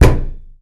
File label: wood crate impact hard; Half Life 2